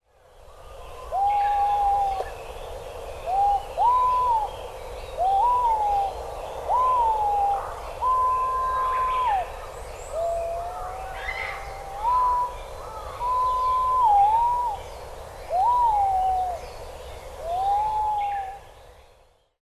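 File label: White-bellied Green Pigeon; aobato; hyogo; koe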